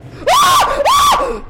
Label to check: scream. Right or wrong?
Right